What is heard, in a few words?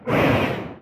unborn growl